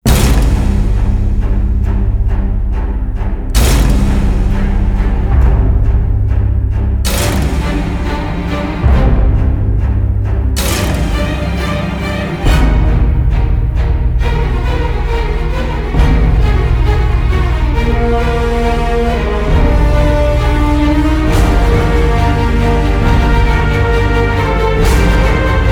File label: (No Choir), Orchestra